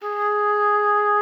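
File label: G#4, oboe d'amore; Xsample VOL-7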